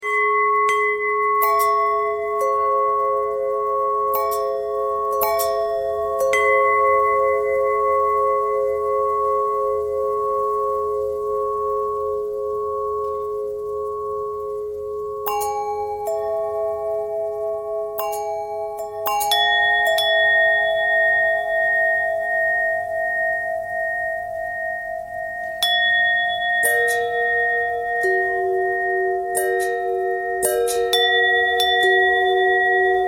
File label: long bell bell bend threads